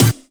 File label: dance hit; E-MU Formula 4000 Series Vol. 2; Dance Hits